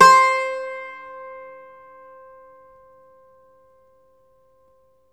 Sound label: IRISH, Old World Instruments